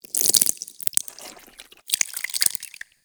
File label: Taunt Flesh, Proto Infested Ancient; Warframe; Abilities